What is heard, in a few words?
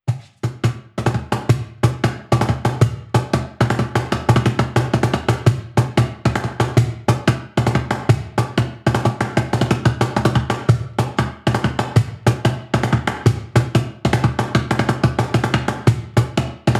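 Clappers paradise, Drums; Drumtastic vol II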